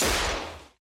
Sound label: High Distorted Gun Shot; SoundsCrate